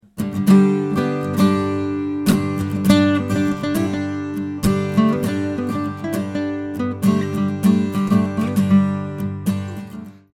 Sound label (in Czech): akusticka kytara; Lekce kytary